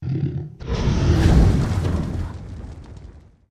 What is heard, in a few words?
Dragon Roars Breaths